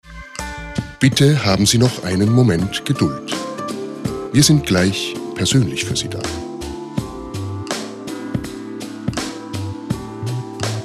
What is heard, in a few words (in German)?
Warteschleife; Notariat Tschugguel; Telefon